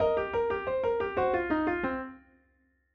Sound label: piano blues run; blues runs